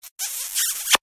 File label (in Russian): korotkij multjashnyj poceluj